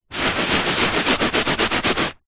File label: household cleaning wiping carpet with dry tissue paper fast wipes; zapsplat